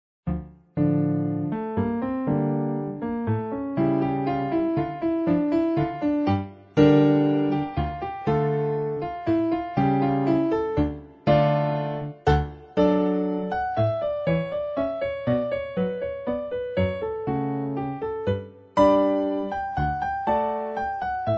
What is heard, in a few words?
mazurka